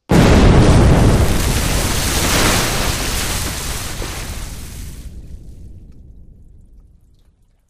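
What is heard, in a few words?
Explosion Water Splash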